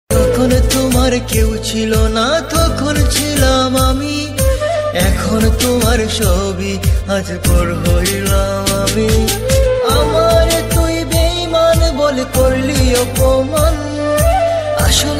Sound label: Bengali Sad Song Ringtone